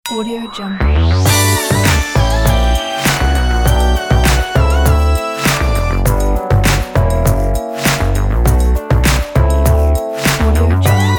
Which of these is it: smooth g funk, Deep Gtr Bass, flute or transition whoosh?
smooth g funk